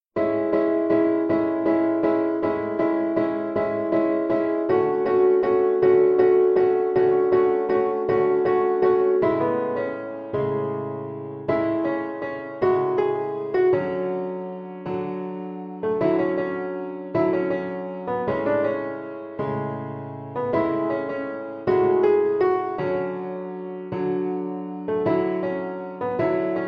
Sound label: easy piano